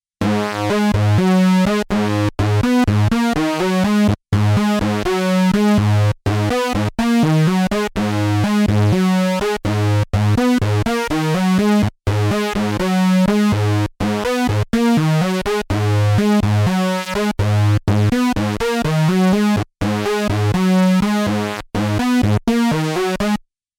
Behringer Kobol Feedback PWM

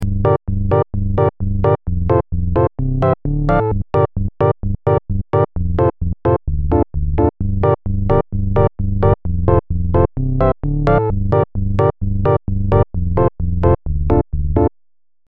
SH01a Farfisa Organ

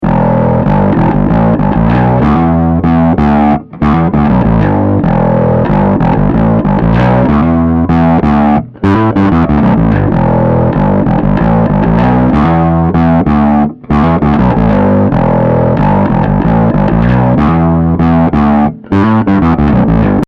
Bass Tight Drive Finger; Amptweaker

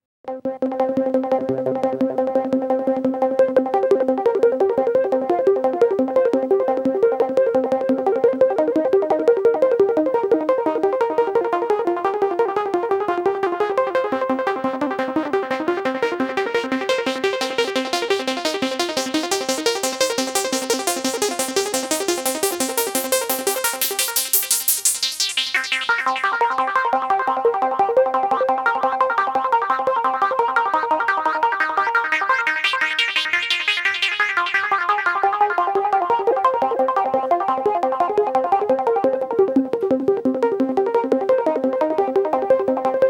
Mini Korg 700S Sequence Delay

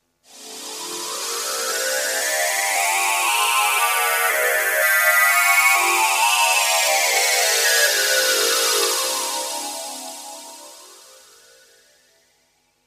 G2 Transporter

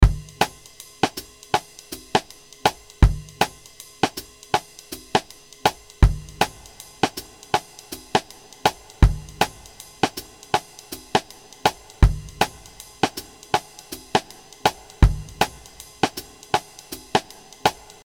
DRY NORMAL HALL RANDOM HALL

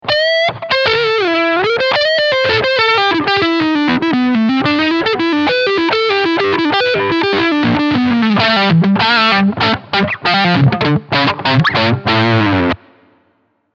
Front HB Crunch

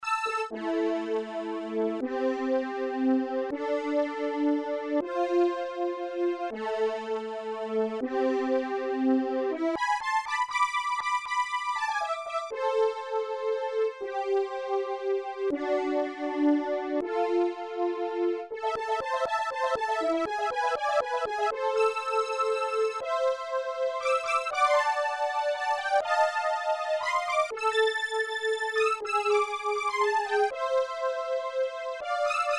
Blofeld French Horns

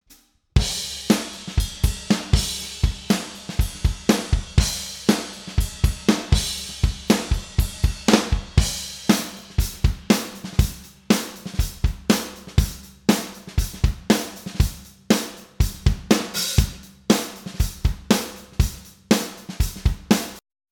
drums original

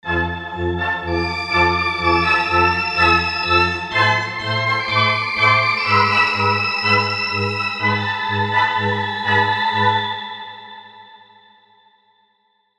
Tape Voiced Pulses; Heavyocity Mosaic

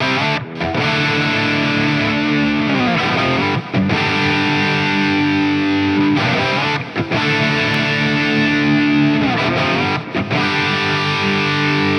Highgain w Pedal OD; Floorboards im Vergleich; Fender Tone Master Pro

